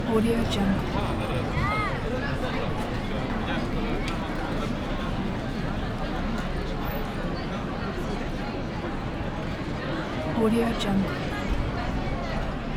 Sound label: city plaza crowded outdoor restaurant ambience